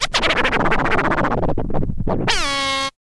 scratch kit, vinyl; Masterbits; Vinyl Attack

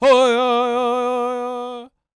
World Voices, CHOCTAW

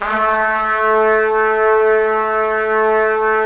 Trumpets; Roland - LA Composer vol. 4

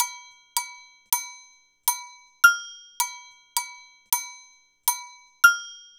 Agogo Bells; Sonic Foundry (Sony Creative Software); Joe Vitale Latin Percussion

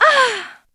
SEX; Zero G; Man Machine

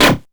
grind, EMU XL-7

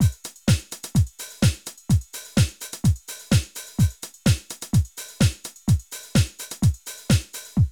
drum loops, House & Garage

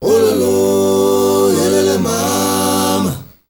MALE GRP, CHOIRS; Spectrasonics; Heart of Africa 2

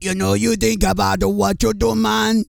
REGGAE, Vocal Planet World Voices

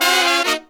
Phantom Horns, 132BPM; 90 s Sample CDs; Zero-G

